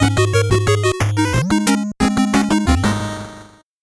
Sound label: 8bit jingle normal